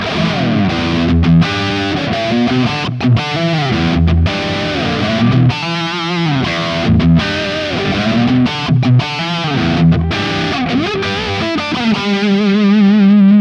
G 300 Pro Humbucker Highgain; Cort

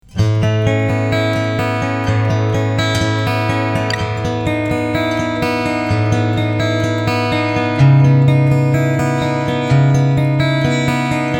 Picking EQ Full

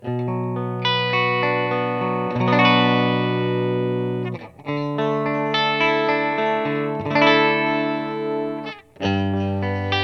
Clean; Boss IR-2

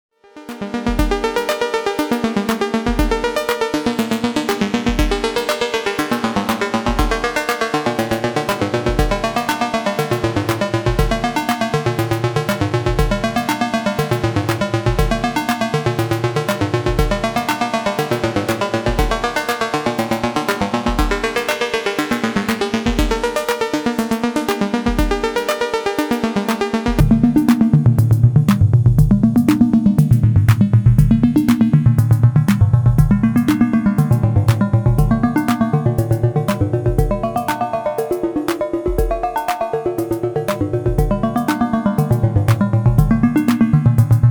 shaping saw tri rec

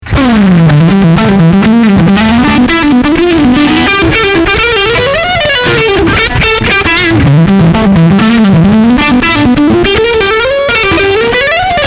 Plexi HOT

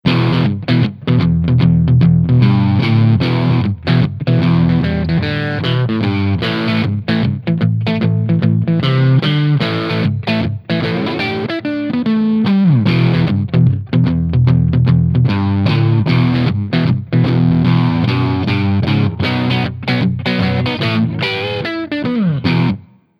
Gibson SG Bridge Neck distorted

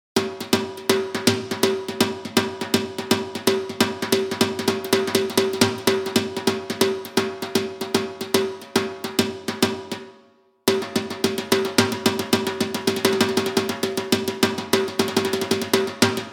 India Dhol

